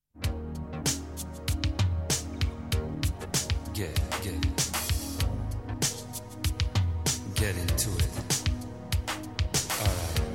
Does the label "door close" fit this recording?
No